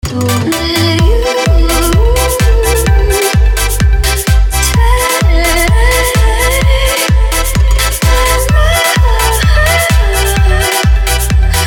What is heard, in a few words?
dance